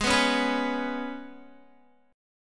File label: G sharp Minor Double Flat 5th G sharp, Guitar; G#mbb5 strummed; 15,14,13,16,13,x